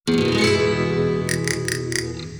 Castanets 180; Live

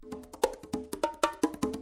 Congas

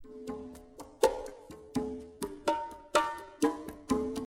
Congas; MPEX

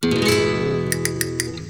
Castanets 130; Logic

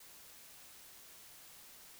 Piano noise

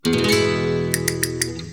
Castanets; DIRAC

